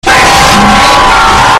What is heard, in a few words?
jumpscare; fnakazgrin 3 0 3